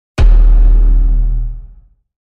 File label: Death Scene Hit